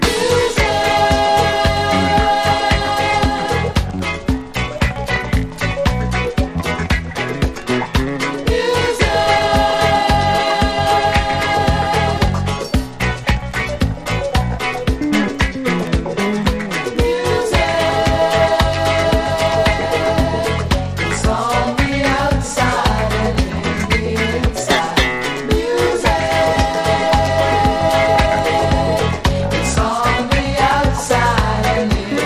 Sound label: REGGAE MUSIC